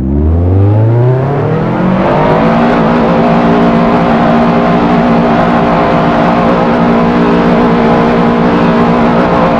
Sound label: rev, vehicles cars; lwcars